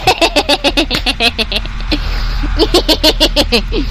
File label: hihihi